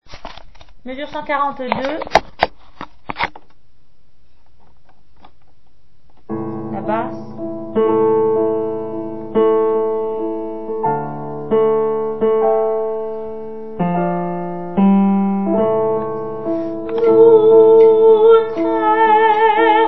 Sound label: Brahms requiem allemand soprano; lettre F